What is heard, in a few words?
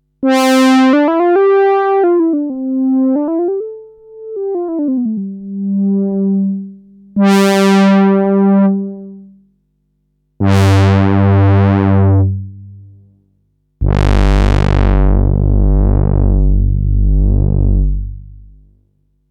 PWM BRASS Moog Model 15 iOS